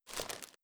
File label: Impact Chipbag